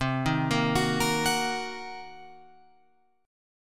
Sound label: C Minor 7th Flat 5th C down Guitar Open F; Cm7b5 arpeggiated; JGuitar